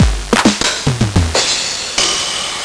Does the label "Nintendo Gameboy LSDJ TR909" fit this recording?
Yes